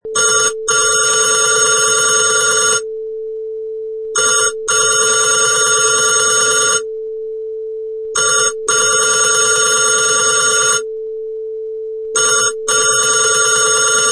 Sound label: Telephone Ring